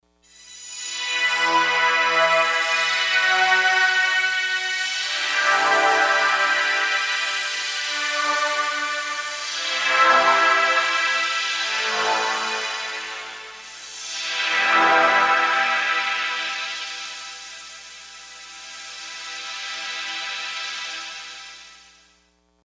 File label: Chime